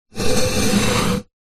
household plant pot ceramic drag on wood laminate floor